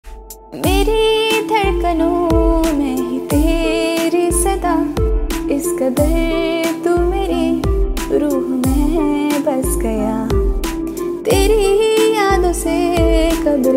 Female Song Ringtone

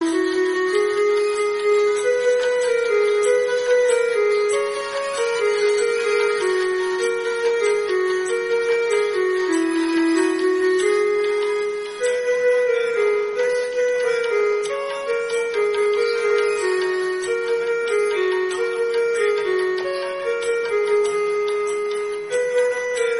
Instrumental

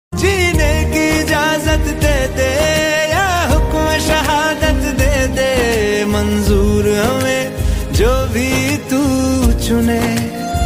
New Hindi Ringtone

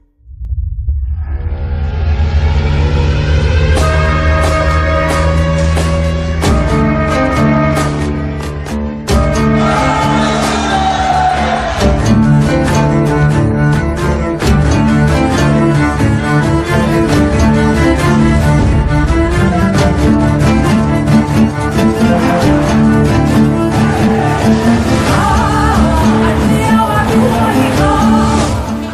Music Ringtone; Sacred Games

